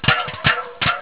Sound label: POP, CANS; EFFECTS